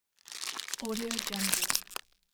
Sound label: plastic bag opening